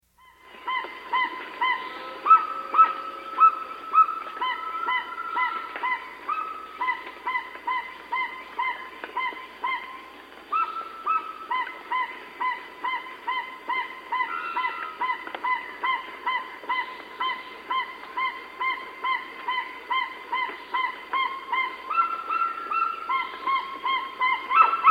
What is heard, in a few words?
Crested Guan; S. America